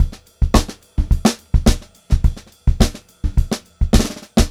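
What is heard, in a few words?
106 groovy variation 2, funk groove drumloops; USB Soundscan vol.38; AKAI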